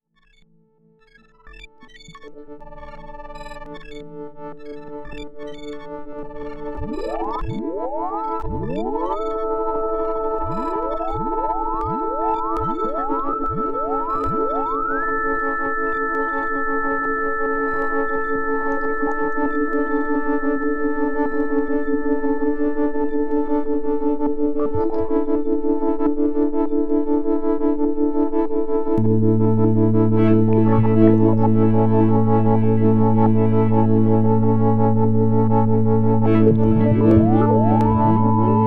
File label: stutter, electronic